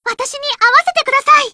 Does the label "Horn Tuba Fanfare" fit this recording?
No